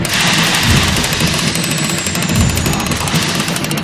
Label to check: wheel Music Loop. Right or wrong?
Right